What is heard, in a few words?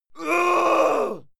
male fight scream pain